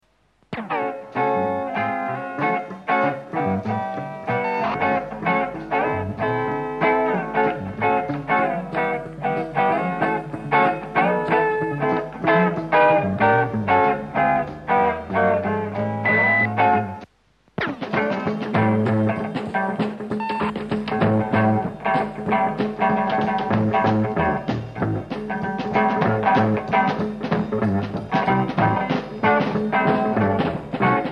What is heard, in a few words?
(instrumental jam)